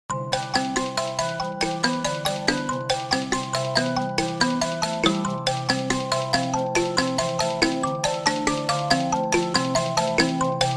mbira of shona people

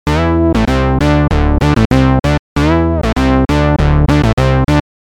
mini classic bass; opxpro3

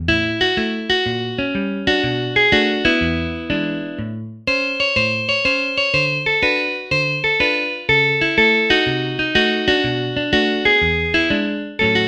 jazz electric guitar shiny

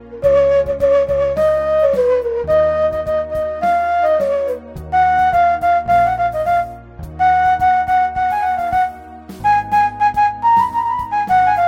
ney ancient persian flute

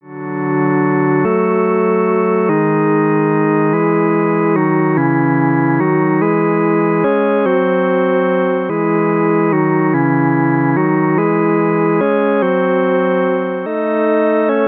organ droner

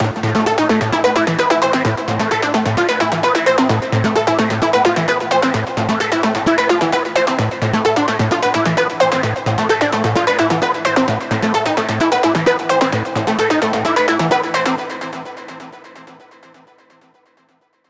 melodic enchantment

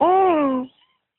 baby girl ahhh wala toy

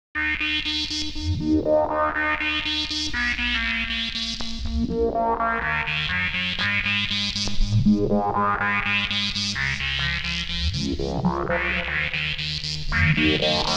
digital age seq

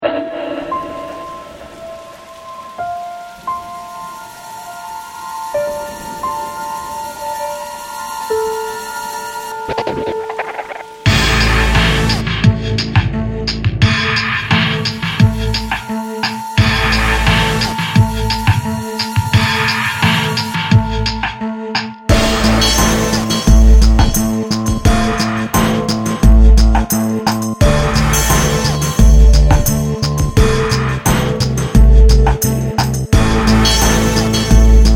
storm

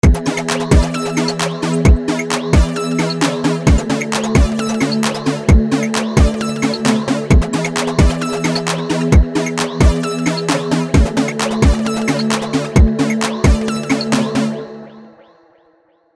electro

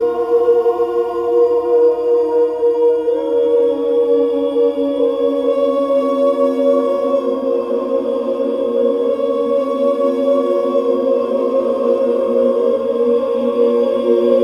trance party fashion disaster

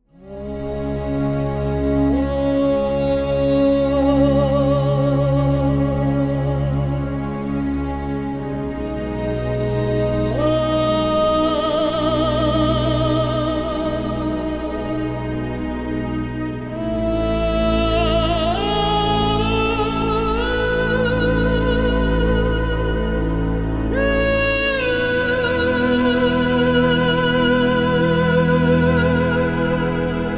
epic legato; zero-g; ethera

